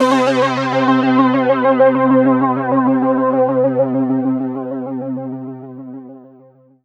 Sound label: Fall, Hits & Falls; Zero-G Trance Inducer